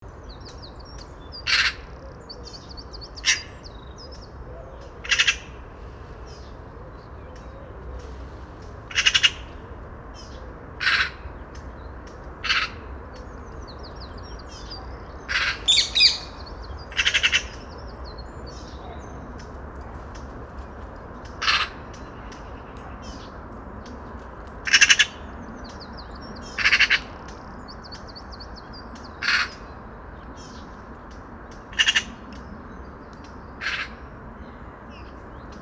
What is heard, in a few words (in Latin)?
Pica pica; SETE